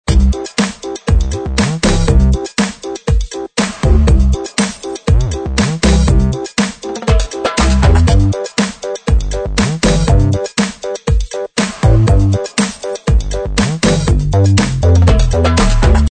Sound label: Ragga Bounce Loop